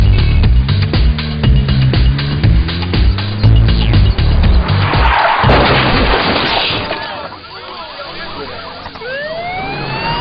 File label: Car Crash; Docomo